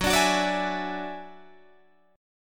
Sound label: Gb 7th Suspended 2nd Sharp 5th, Guitar, Drop D